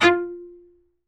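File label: F3 SPICCATO, CELLO; Northstar; New Gold VOL-2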